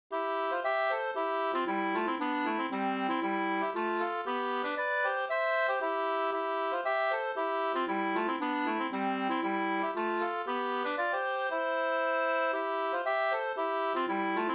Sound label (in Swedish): polska, 2st; Gas-Anders